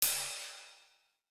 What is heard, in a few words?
Jazz slide close; Nokia; audio themes